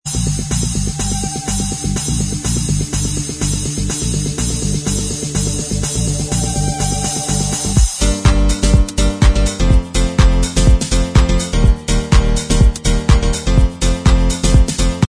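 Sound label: remix, instrumental track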